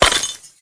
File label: Ice Removed; candy crush jelly saga gamerip